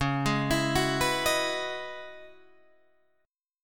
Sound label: C sharp Minor 11th C sharp, Guitar DADGAD; C#m11 arpeggiated